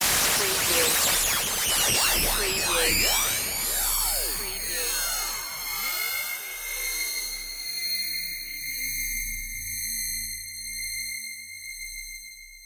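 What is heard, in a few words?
Electric Insanity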